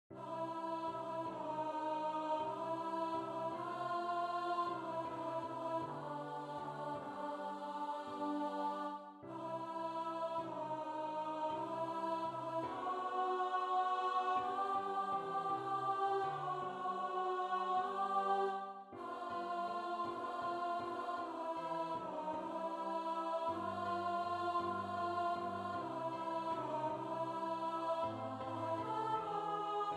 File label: alto; Sweet and Low